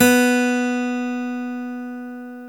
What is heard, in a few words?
GTR, Dual Guitar; Club-50 - Foundations; Paul